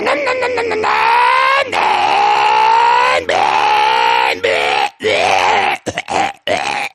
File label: formula 1 total, ringtones